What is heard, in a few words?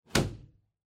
Motion Slam Gentle